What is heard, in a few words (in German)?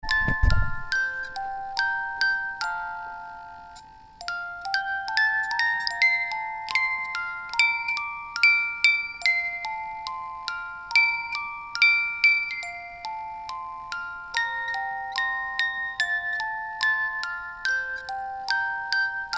Spieluhren; alle meine entchen